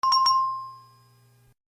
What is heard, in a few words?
chime